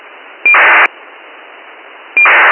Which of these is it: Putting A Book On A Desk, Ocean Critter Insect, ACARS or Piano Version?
ACARS